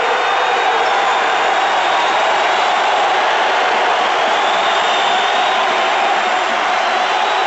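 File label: Basketball Crowd Jeer Loop; Crowds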